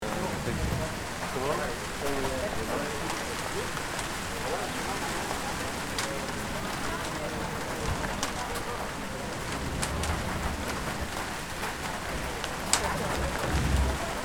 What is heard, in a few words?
Rainy train station rain patter on metal platform